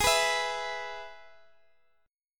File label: Ab Minor Sharp 5th, down